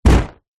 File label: human body slam against surface